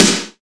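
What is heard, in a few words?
SNARE, Drum Machines; EdgeSounds; Drum Mashines VOL-1; X-DRUMS KIT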